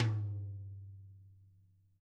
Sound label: DW HIT MPD, Double Platinum Drums; ILIO